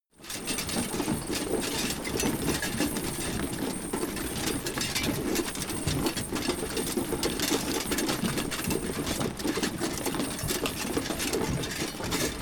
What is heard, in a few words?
troops metal clatter chaos